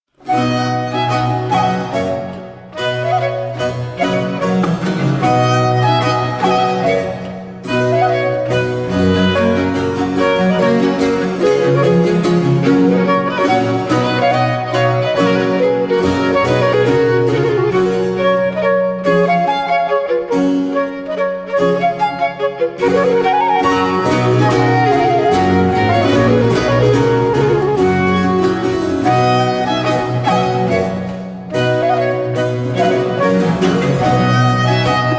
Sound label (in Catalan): Triosonata violí flauta i basso